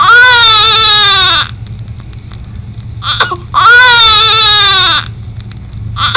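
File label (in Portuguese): fome, sons e choros pediátricos; PEDIATRIA UFF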